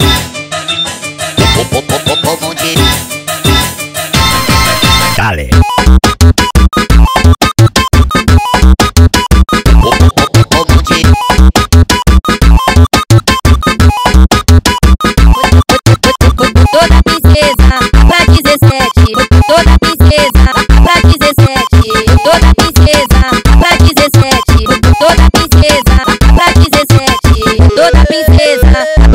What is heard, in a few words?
(Sped Up)